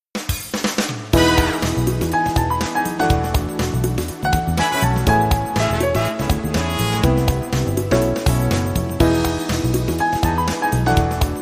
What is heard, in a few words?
Morning Piano; 30sec